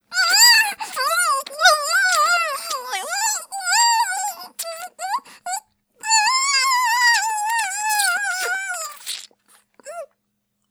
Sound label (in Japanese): ごっくん; Honey Drop